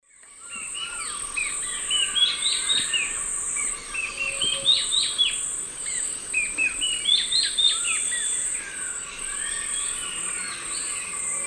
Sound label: Myrmotherula multostriata, fast song, S. America Eastern Lowlands